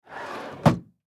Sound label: Computer Desk, Computer Desk Keyboard Drawer Slide In; Blastwave